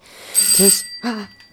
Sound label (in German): klingelschock